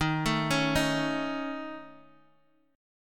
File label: A Major Flat 5th E flat, chord